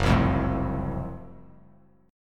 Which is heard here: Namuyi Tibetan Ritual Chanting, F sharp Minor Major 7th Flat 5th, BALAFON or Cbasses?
F sharp Minor Major 7th Flat 5th